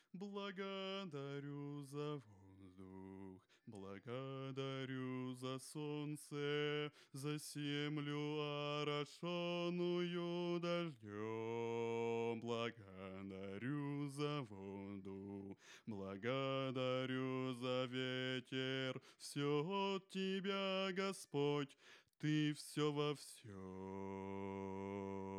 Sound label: bass dyn